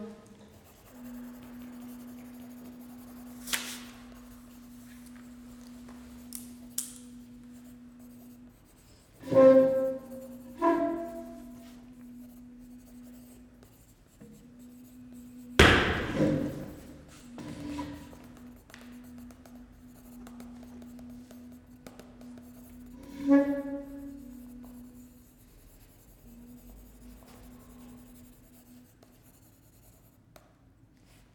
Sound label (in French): silence; atelier espace des mots